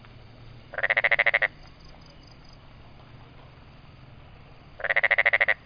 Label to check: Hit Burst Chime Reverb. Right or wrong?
Wrong